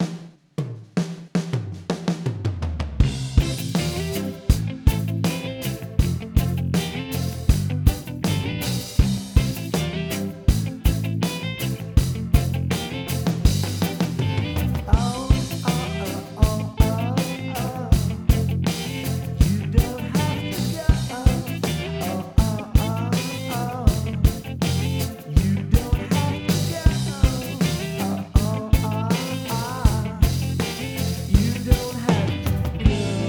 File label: rock, Gretsch Round Badge 1961 kit, stick